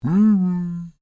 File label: Created Creature Large Exclamation, Alien Sound Effects